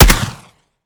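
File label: weap suppressed; weapons; gmod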